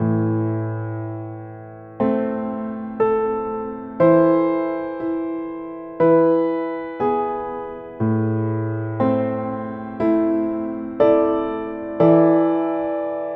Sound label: Sad Theme